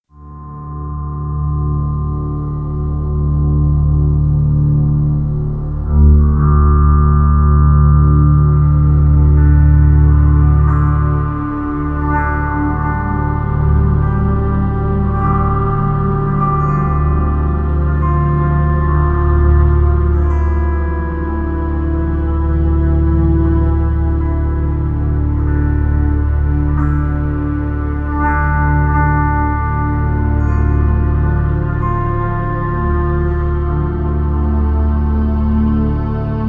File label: melancholic strings